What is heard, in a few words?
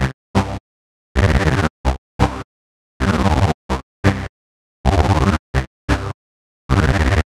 130 Cm synth, Synth Loops, Trance; Trance Explosion Vol1